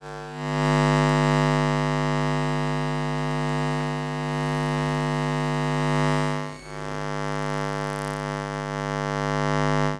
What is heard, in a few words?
Electromagnetic Fields